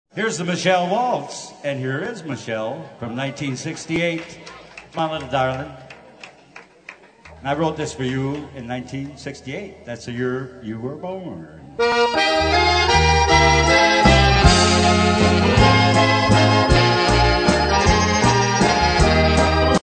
waltz

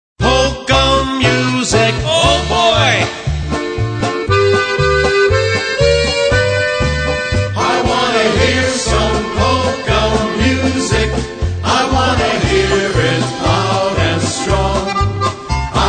POLKA MUSIC

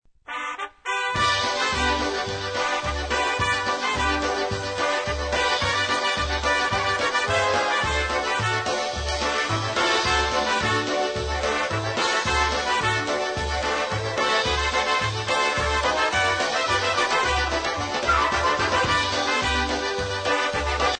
POLKA

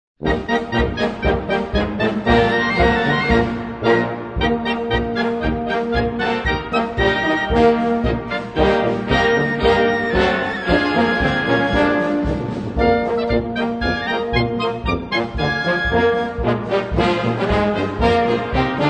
POLKA

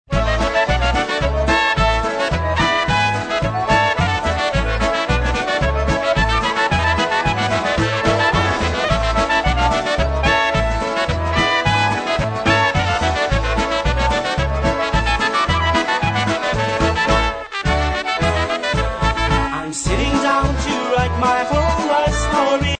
POLKA

